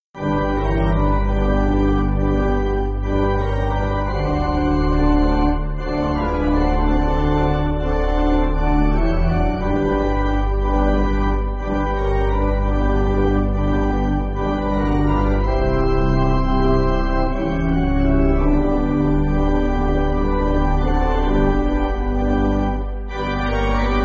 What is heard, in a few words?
Pipe, Pipe Organ, Backing Files for Churches; J.W. Van deVenter